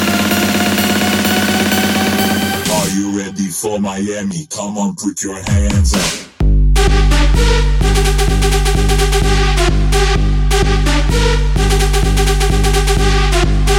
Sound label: Miami Club Ringtone